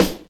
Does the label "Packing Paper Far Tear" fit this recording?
No